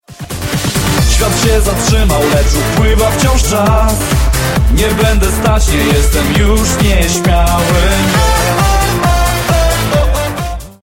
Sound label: Electro